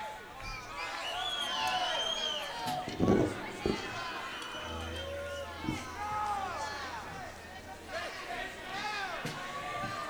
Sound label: Tuning-Breather